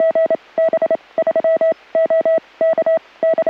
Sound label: Morse code; Scanner